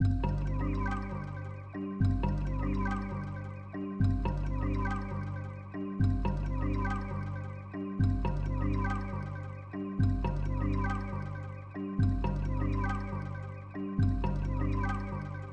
Easy Jungle Loop

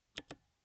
Mechanical Keyboard Click

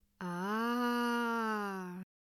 Aaah

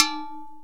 Tonk

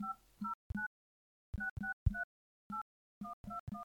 Android Keypad Dialing

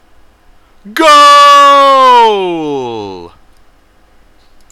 Goal

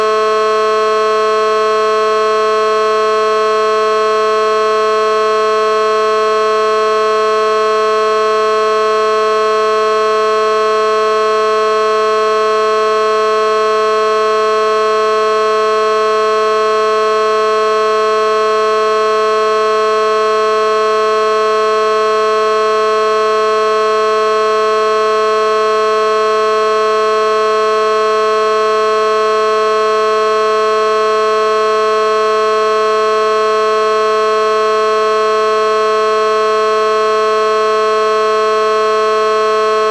Whelen Horn

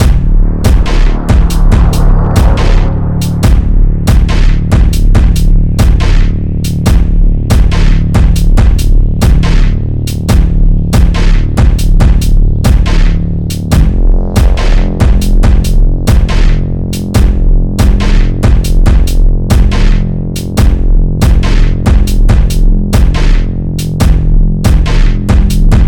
Drumbeats Melodic 70 Bpm Dark Dubstep

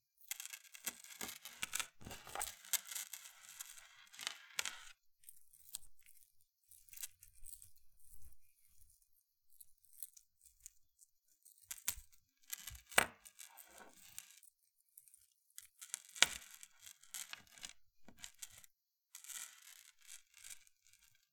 Piece Of Jewellery Placed On Table

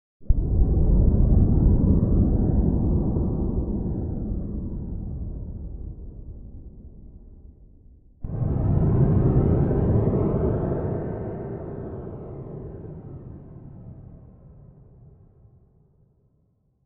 Swarm Whoosh

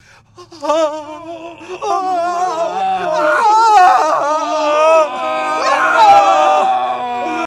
Ahhhhhh

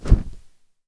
Wing Flap Flag Flapping

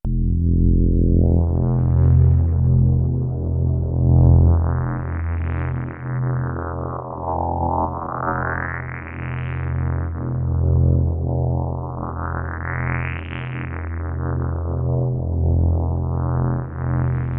Nord Sustained Morphing E Pad

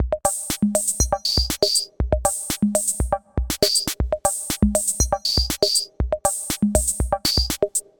Cr78 beat 120bpm

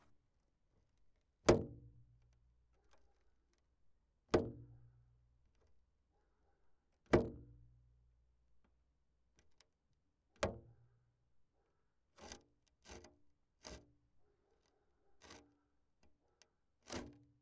Hits Wood

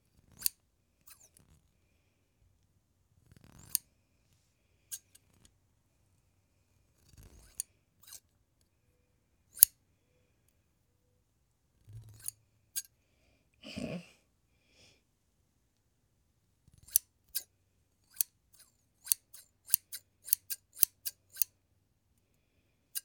Scissors

